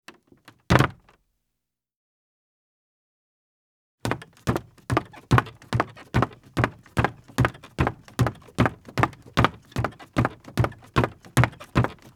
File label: Footsteps, Foley; Sound Ideas 6016